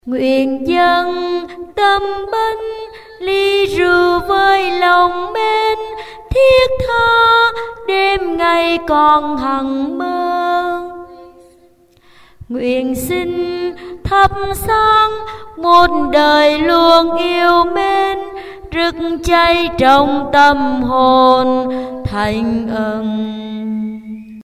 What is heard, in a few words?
tenor basso